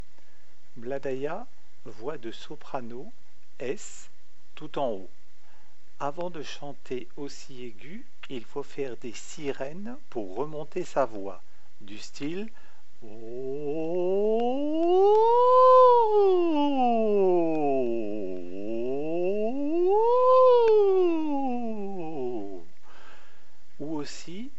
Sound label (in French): Soprano